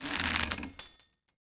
chandelier Swing; objects; Star Wars Obi-Wan; XBOX

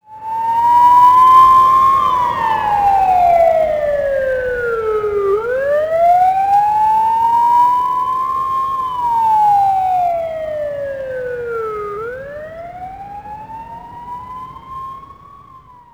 Police siren US; ambience; Project Gotham Racing 2

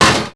wireframe right, footsteps; characters

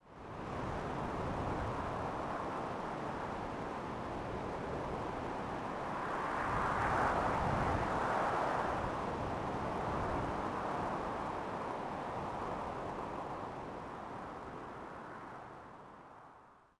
theed Wind Gust; Star Wars Obi Wan; XBOX

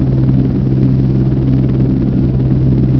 heli in loop

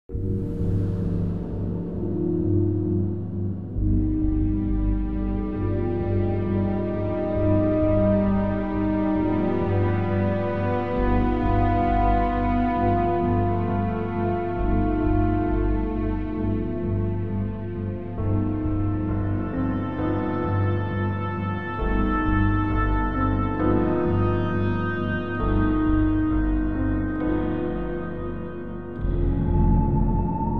Temple Amb; music; The Da Vinci Code; XBOX

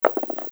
dice roll; craps

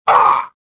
pain

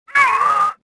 choke; Star Wars Jedi Knight II Jedi Outcast; XBOX; shadow

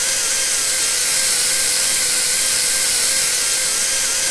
Toy Cars Loop; BedRoom

